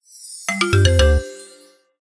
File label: win; Amatic; Chinese Spider